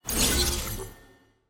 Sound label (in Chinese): 传奇球员和现役球员之间切换; nba; 登陆系统